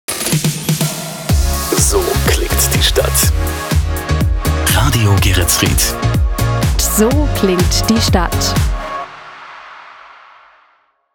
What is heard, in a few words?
Jingles; Radio Geretsried; Fruit Punch